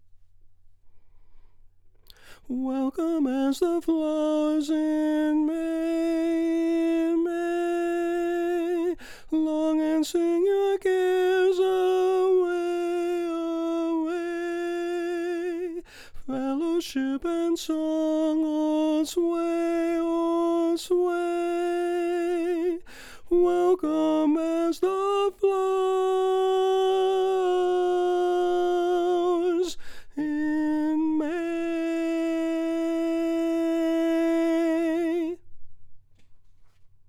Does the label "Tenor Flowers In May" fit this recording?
Yes